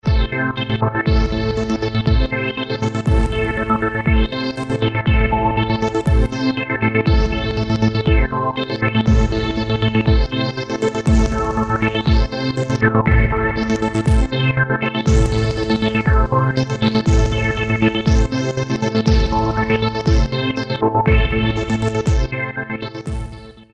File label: Pad